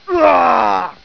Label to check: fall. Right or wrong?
Right